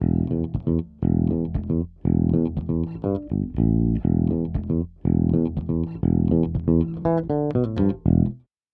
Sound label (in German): E Bass ohne ONE LA; IGS Audio